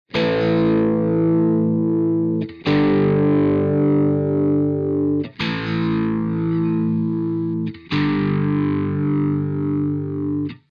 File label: notch filter; orinj